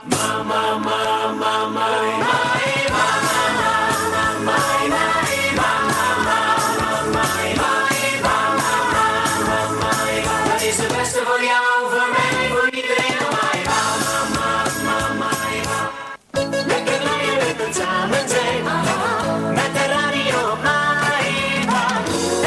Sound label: jingles; MAEVA